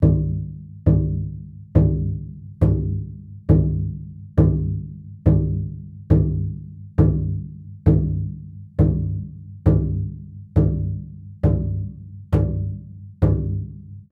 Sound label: Big drum; Nightsong (Hobeea)